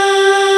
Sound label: AHH G3; E-MU Formula 4000 Series Vol. 3; Analog Odyssey